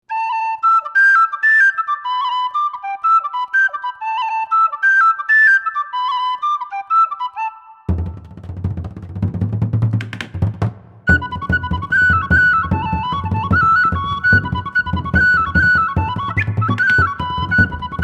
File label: IRISH WHISTLE Dance Tunes; Folk